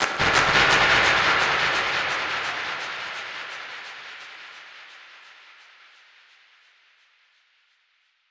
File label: Perc Hit, dub percussion; musicradar